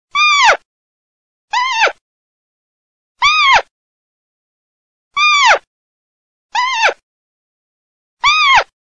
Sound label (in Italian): CAPRIOLO